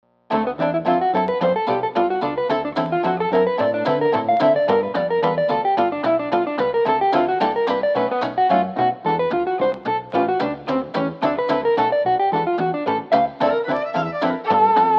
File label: Le Jazz Hot